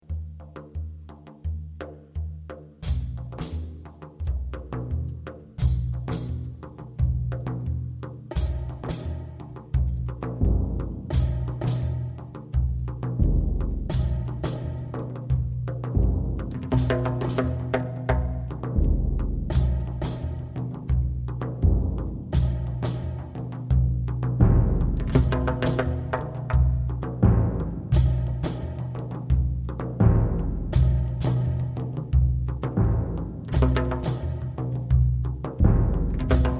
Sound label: Trance